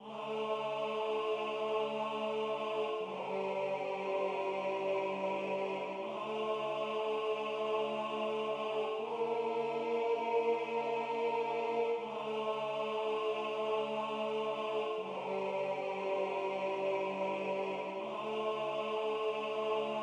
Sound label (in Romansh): Tschiera Tenor